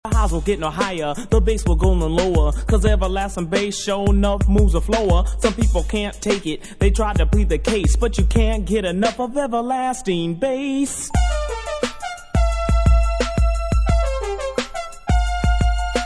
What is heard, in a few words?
everlasting bass; electro